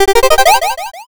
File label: picoquestion